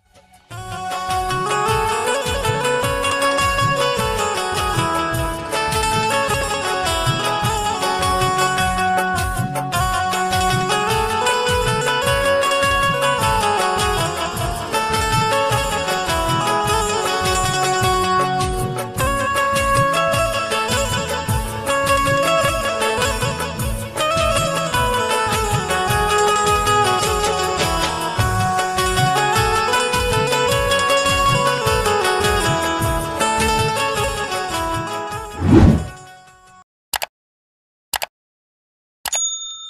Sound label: instrumental ringtone instrumental song bgm ringtone hindi ringtone